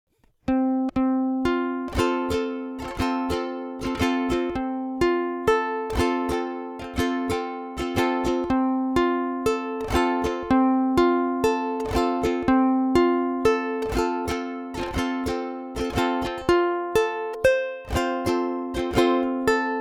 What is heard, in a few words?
Reveille